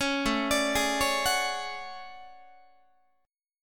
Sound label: A Major 13th Guitar; AM13; arpeggiated; JGuitar